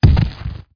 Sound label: boulder; Heretic II